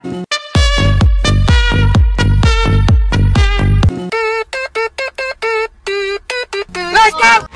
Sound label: romanian dance hei hop lets go